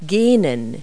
yawn verb; Multi-Lingual Talking Picture Dictionary

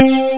collect; jetsetwilly3

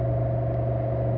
AMB

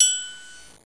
triangle

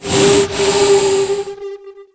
hammerhead hit; DAoC

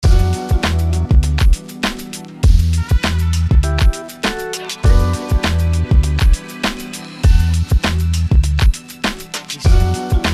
Abstract HipHop; E-Lab; e-LAB MP3 Demos

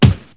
whack; Wired for Sound Pro CD; Aristosoft, Inc.

